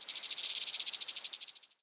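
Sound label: scorpion move; scorpian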